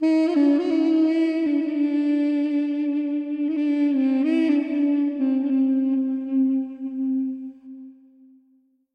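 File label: duduk sounds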